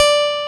D4, GUITAR; AKAI S-Series CD-ROM Sound Library VOL-8

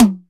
TEK TOM HI; Drums In Your FACE!

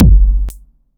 Boomclick, Kick Drums; Zero-G; Soundclash

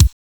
LYT BRYT K; Drums In Your FACE!

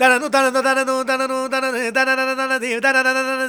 Vocals Male World Pop

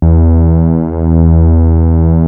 Prophet 5 E3, Synthesizer; Hollywood Edge - Giorgio Moroder Rare Synthesizer Collection

